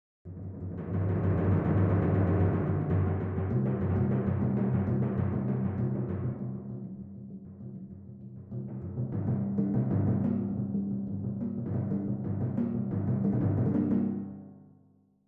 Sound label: timpani film score and fanfare; sibelius sounds